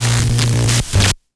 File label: death static